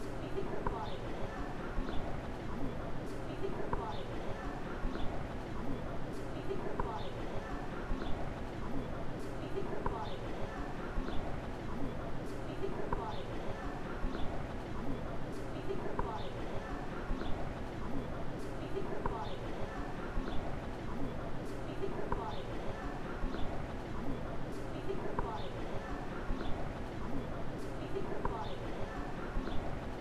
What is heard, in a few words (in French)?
melodie